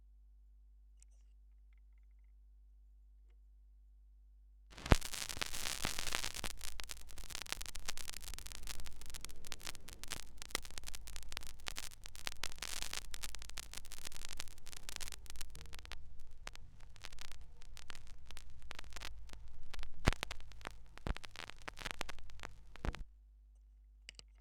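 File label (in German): schallplattengeraeusche, record noises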